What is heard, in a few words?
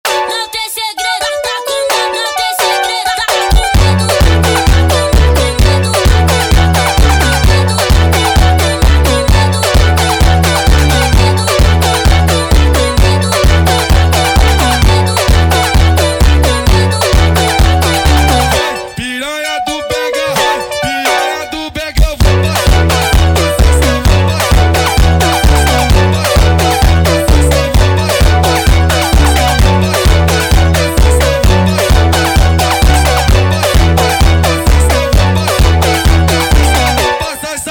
phonk music